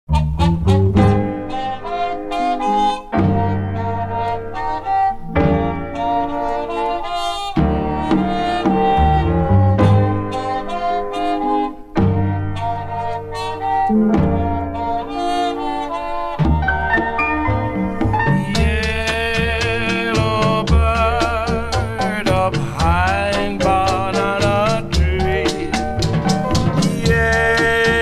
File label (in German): Melodie